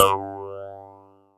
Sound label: THROATY, PHASE; Synth